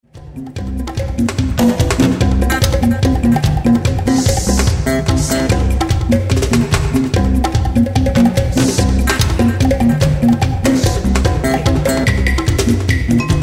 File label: drumtrip